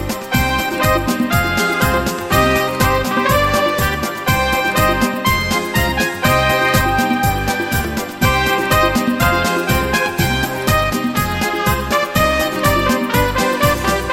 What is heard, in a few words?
Pasodoble